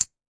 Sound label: marker click; baccarat2